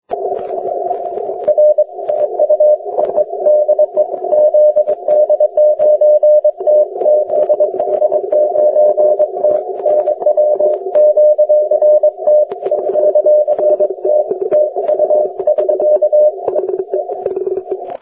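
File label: 40m Morse code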